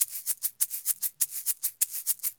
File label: Eggs 100, Drums & Percussion; Best Service; Festa Latina VOL-1